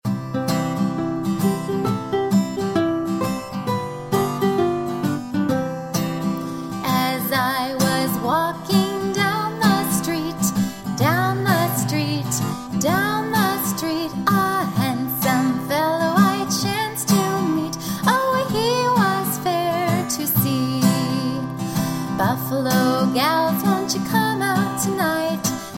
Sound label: vocal; Buffalo Gals